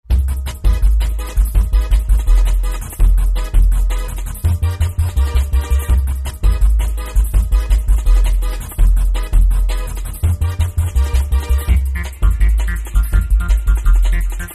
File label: jungle dance drum n bass